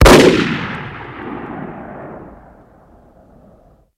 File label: Gun AR-15 Single Shot Fire